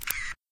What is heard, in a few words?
shutter click